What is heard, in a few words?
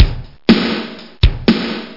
BEAT; r5-drums